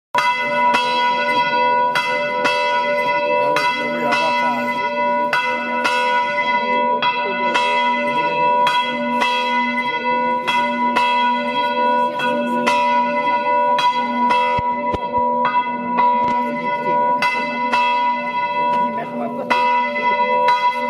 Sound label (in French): GLAS; ST GENARD